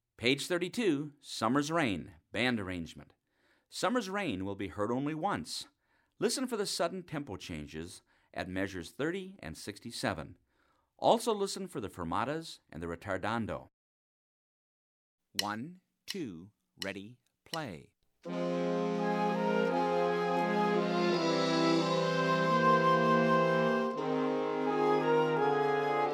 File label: summers rain band arr